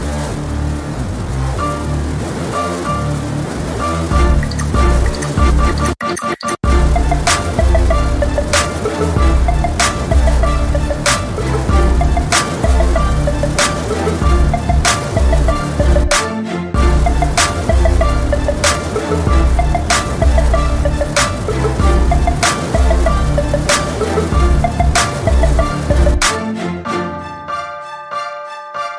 karate fighting

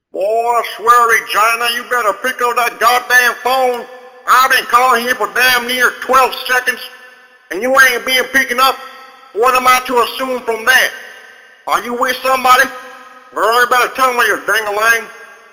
Mambo

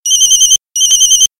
Dring Ring

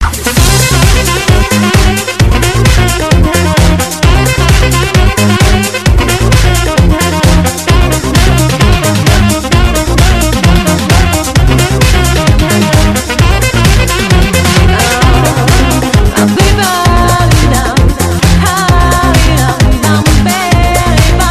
Saxofone Techno

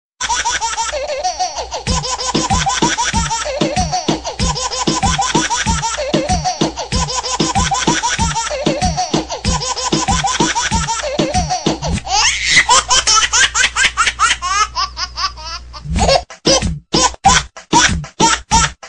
Laughing Baby

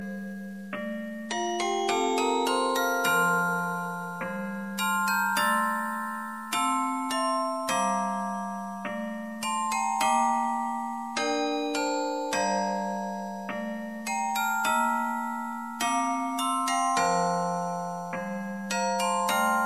Quiet Celebration

